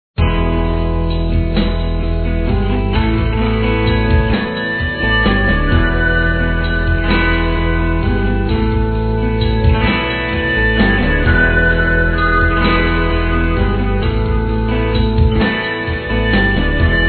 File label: game over music; mad pac rabios